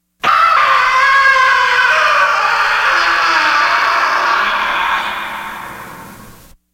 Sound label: scream